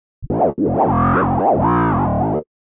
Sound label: Funky Electronica Transmission; Communication Sound Effects; Andrew Fleming